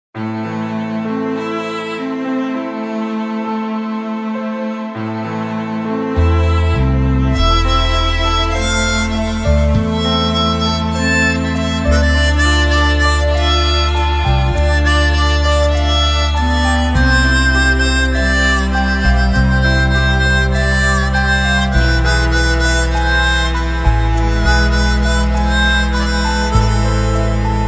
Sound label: Tango, harmonica